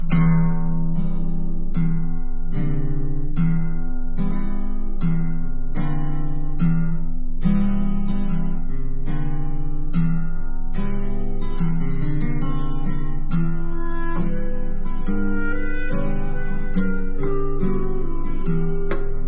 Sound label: instrumental